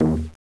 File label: collide